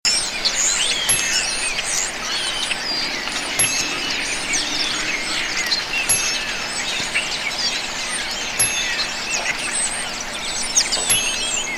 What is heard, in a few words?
Flock of Noisy Birds